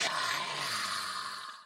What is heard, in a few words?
idle, evocation illager; PureBDcraft; minecraft